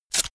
velcro large open fast

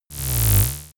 buzzing whoosh

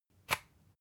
usb port metallic out soft

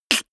beatbox snare harsh

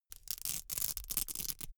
velcro open cap slow

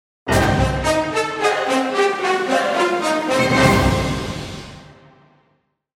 cinematic horns

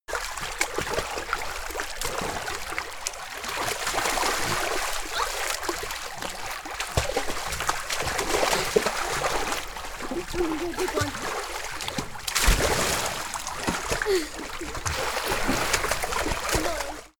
children playing swimming pool

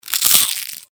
eating chew crisp

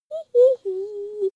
child laugh fake he-he-he

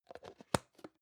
plastic can small lid close soft

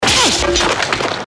fight cut

